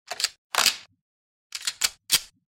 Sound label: reloading weapon